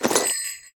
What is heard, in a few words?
armor heavy; smite; chiron